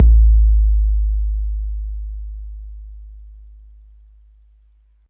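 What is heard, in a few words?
bass drum 808 MPC60 Click G#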